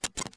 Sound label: pop; Falcon4